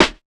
snare; dough-samples; eddyflux

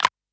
snap; eddyflux; dough-samples

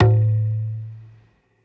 djembe; eddyflux

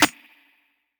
snare; dough-samples; eddyflux

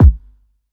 kick; eddyflux; crate bd; dough-samples

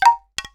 perc; eddyflux; crate block

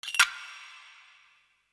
perc; eddyflux

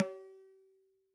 dhin, mrid

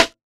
snare; dough-samples; eddyflux

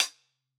closed hh; eddyflux

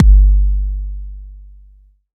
bd; drumkit; switchangel

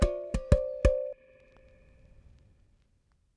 bongo; eddyflux

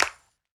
clap; eddyflux; dough-samples

